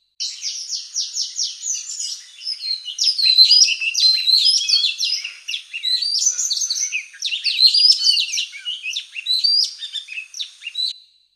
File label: Oriental Magpie Robin courting song; Sri Lanka